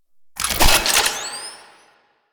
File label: Shotgun Quick Reload; Weapons; Warframe; Pax Duviricus